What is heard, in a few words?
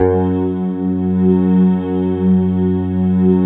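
synth Morpheus; Sound & Vision - Gigapack II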